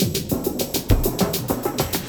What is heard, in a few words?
loop, Drum & Bass, 100 BPM; Ueberschall; Drum & Bass Resonance